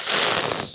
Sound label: hiss